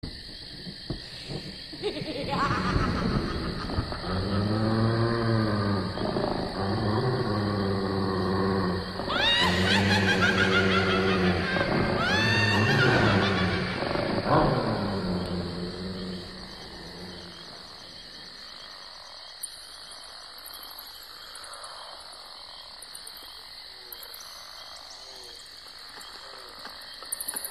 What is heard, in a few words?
ambiance, zombie escape